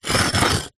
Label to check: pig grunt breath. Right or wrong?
Wrong